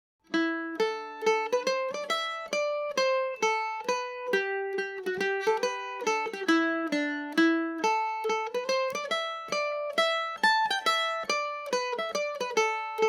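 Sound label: A dorian, irish mandolin, tunes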